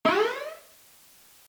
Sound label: Radio